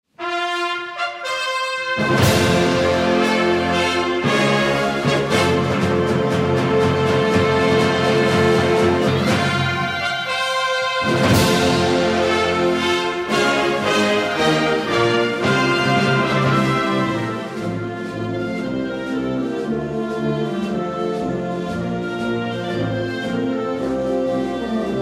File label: nordic fanfare and hymn